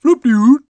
blup

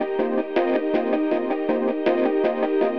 AMBNT 160; eJay; Ambient

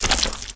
GUTS; Forsaken

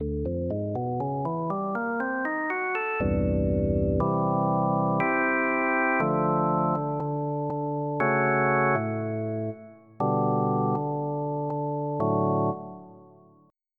Reed Organ; EverQuest

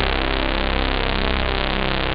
space, synth misc; OctaMED SoundStudio V1

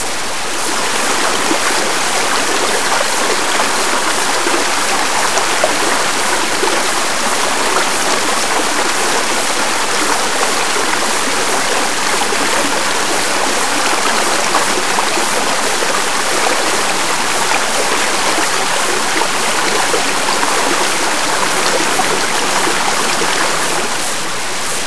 WATER; Mr. Wonder's Greatest Toyshop on Earth; OmniMedia

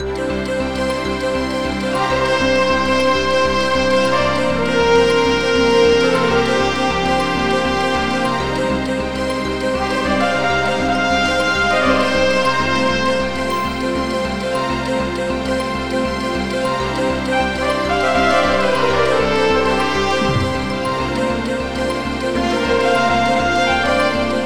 TECHNO

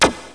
teleport Effect; NETSTORM

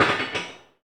saxe; kult

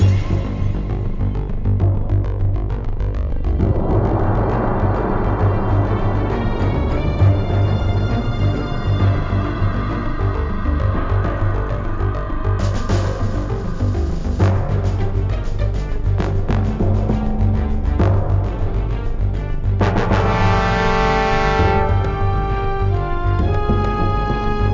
suspense; phoenix

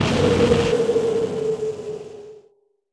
wpn missile; PC Gamer IT CD 47; Centauri; alien-x-fire-demo

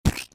IceBall Splashed; bob espo

